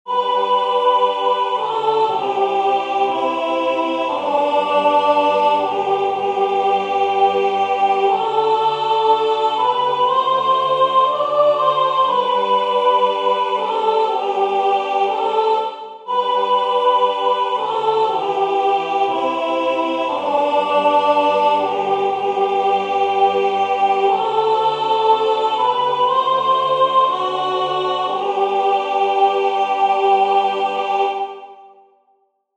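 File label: Tama Ngakau Marie soprano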